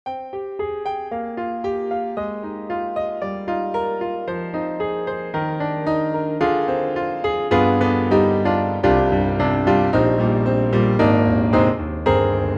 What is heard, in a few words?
Imperial Grand Warm Grand; IK Multimedia; SampleTank3